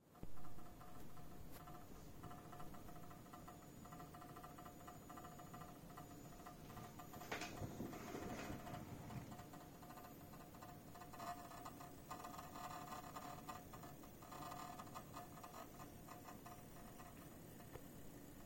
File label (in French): videoprojecteur produit un bruit de vibrations a l'arret projecteur